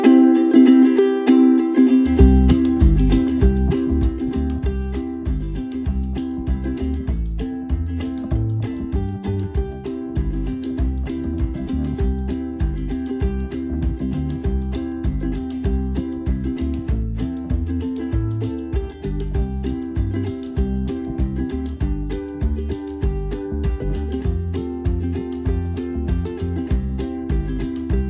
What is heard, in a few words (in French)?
teaser musique; Happycity teaser